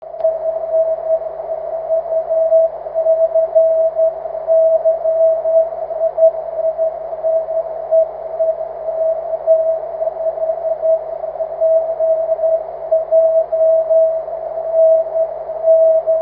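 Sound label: continuous wave, 23cm; k5pjr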